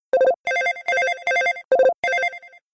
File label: Business electronic 6 short rings normal